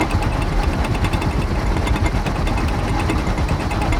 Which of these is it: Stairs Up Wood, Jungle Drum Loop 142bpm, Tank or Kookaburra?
Tank